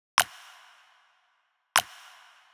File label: Trap City Clap